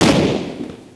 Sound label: 3 INCH GUN